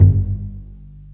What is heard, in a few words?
STR Cb Pizzicato; Roland L-CD702; Cb Pizz 1